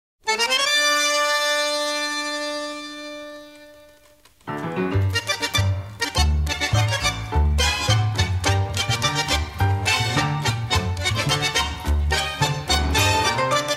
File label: instrumental